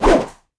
Whoosh

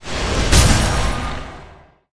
EXP Call

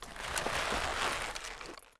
Idle leather mid

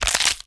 hit bone

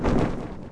fly

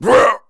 MON ATK

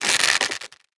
Bow loading

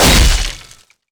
Weapons Axe

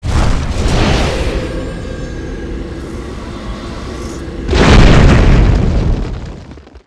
stemping; Baphomet

